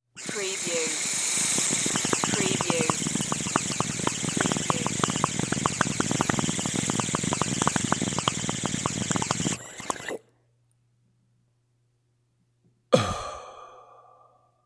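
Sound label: Tobacco Bong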